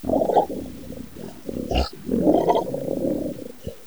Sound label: kids